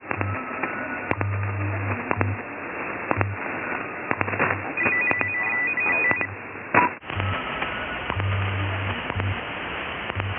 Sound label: 10MHz BelkaDSP